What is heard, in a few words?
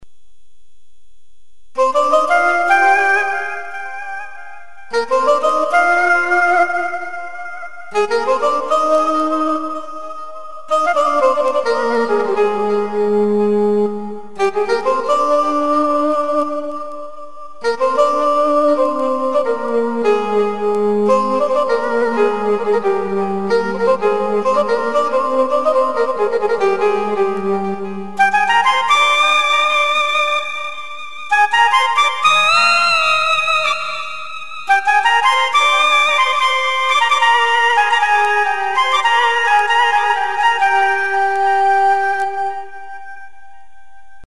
sax flut; gw-8